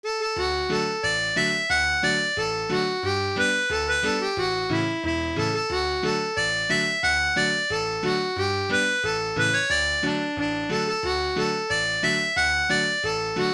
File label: Fitzwilliam Polka, fiddle and dance tunes